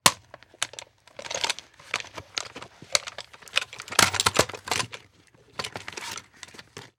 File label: Rifle Grab And Drop, weapons; AdobeCC